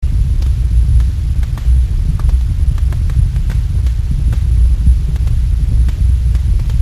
fire; de killers

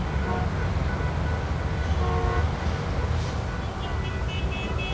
traffic

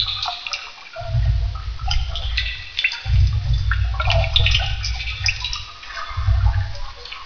creepy dripping; firedamp

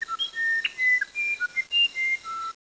bird; copan

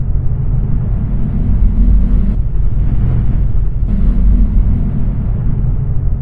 amb rumble loop; hellhole

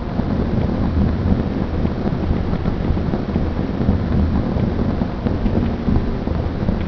magma; de oimec

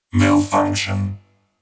malfunction

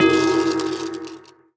Upkeep Ring; warcraft3

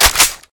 mag clipout; weapons; deluge

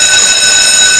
zzz; de school13

